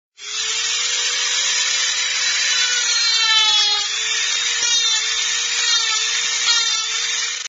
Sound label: Dentist Drill